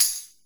PERC, Drum & Bass, 160 BPM

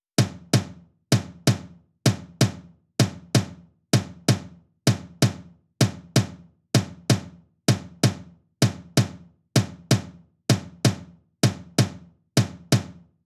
128BPM Tom; Demo Constr Kits; Early Morning Kit Root G

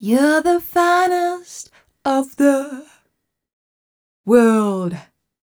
f mel e flat, fem Vocals

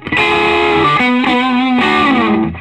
SOLO, Vintage Blues Guitar; AKAI; USB Soundscan vol.22

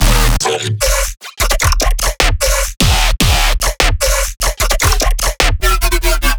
bass loop 150 D#; predetermined; Virtual Riot

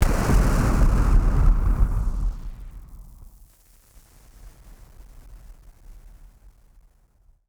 Party Popper FX

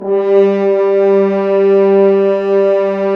BRS, FHns Dry; Roland L-CD702; F.Horns 2

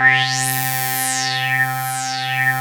REZO LD C4 R, PPG, Analog; USB Soundscan vol.49; Analog Fantasies; AKAI

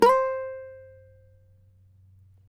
CAVAQUINHO SLIDE, Guitars; Spectrasonics; Hans Zimmer Guitars VOL-2

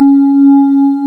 C#, Synclav FM, WIND; Big Fish Audio

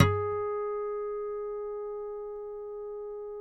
HARM G#4, Guitars; Spectrasonics; Hans Zimmer Guitars VOL-2; FNGSTYLE PFM

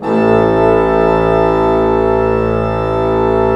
PLENO A1, Cathedral Organ; ILIO; Propeller Islands

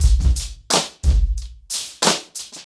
90BPM, acoustic drums; The Roots Drumloops

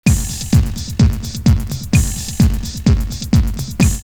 Rave drumloops 128; X-Static Goldmine; 90 s Sample CDs

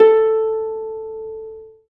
STR HARP, STR Orchestral

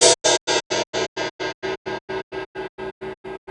Gated Cymbal; musicradar

